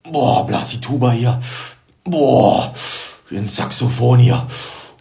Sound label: doahh